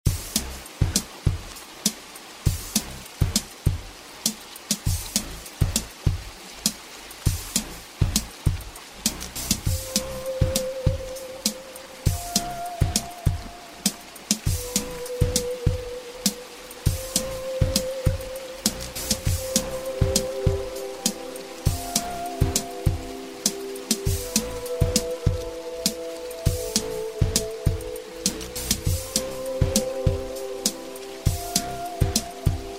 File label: beat